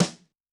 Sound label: Blast X, Yamaha DTXpress-II snare